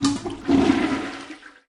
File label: Toilet Flush; lethal company